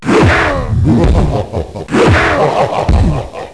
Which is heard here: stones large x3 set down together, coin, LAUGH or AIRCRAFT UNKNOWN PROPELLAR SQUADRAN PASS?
LAUGH